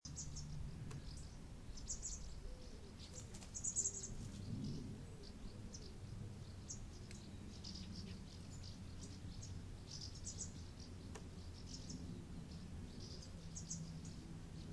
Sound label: birdsong and quiet the sounds of herefordshire countryside